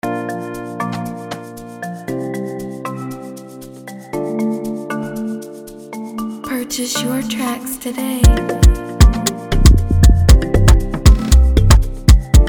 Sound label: Free beat 117bpm F major